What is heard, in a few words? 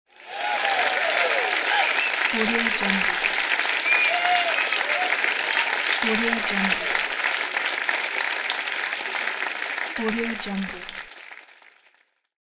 applause and cheers